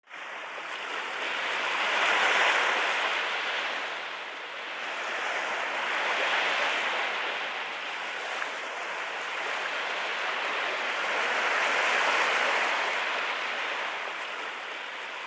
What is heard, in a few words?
sea; kappa; Shin Megami Tensei Imagine gamerip